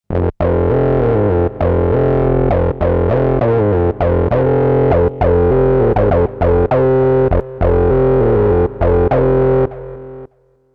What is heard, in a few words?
MS20; Arturia V Collection 9; Vintage MS20 vs. MS 20 V